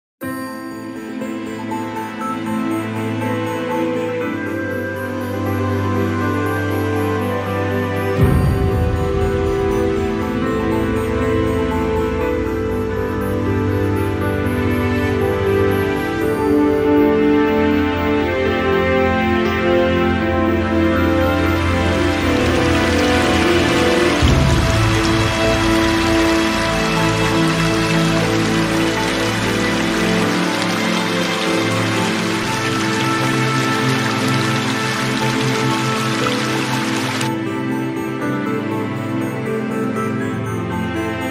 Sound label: relax refresh